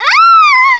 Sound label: Female, DeathWails; Riviera